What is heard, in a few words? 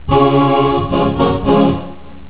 short hallelujah